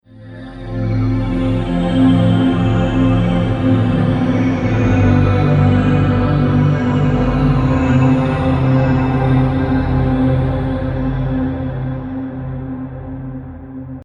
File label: Epic Opening Scene Synth; Musical Sound Effects